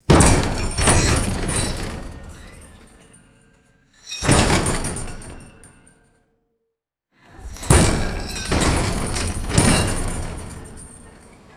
heavy body hitting chain link cage

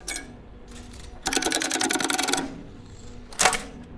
rapid mechanical telephone relay pulses

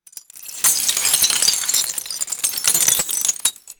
glass fragments shattering on glass debris

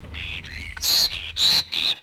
harsh raspy bird squawks and chatter

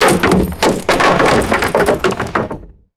heavy wood debris clattering on stone

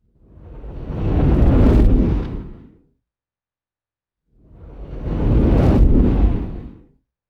slow airy rumbling whooshes; swooshes

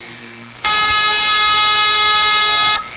loud harsh electromechanical buzzer burst; alarms

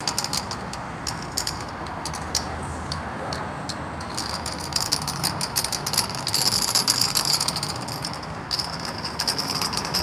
short bursts of metallic chain rattling; chains

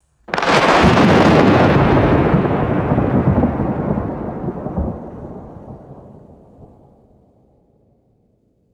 medium thunderclap with rolling rumble; weather

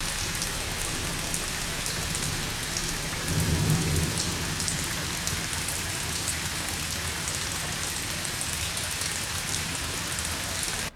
heavy rain on metal fire escape

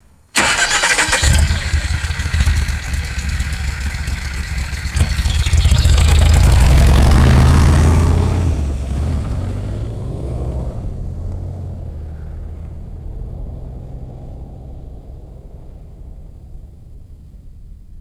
land rover starts and drives away; vehicles

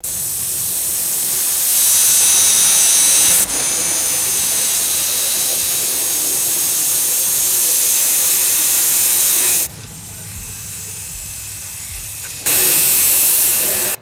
continuous loud steam and air hiss; machines

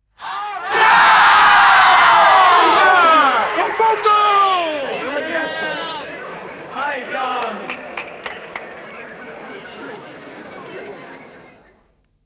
loud cricket appeal and disappointed murmurs

